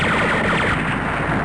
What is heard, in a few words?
EXPLO